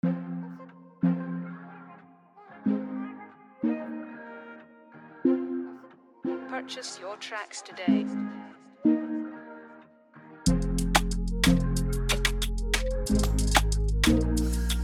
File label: 92BPM, type beat